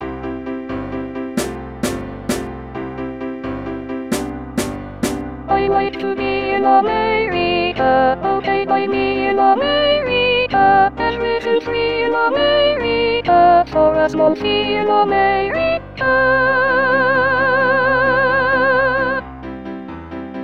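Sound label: America Soprano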